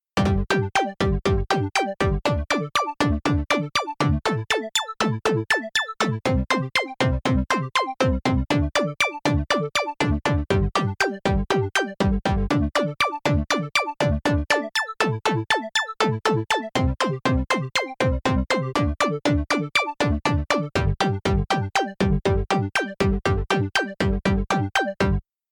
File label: upbeat 80s synth